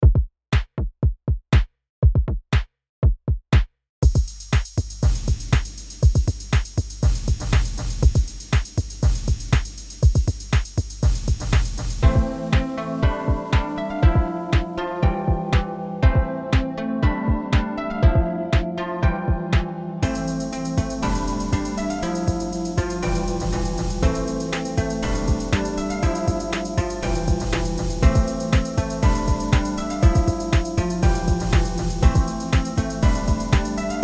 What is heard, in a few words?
berlin beat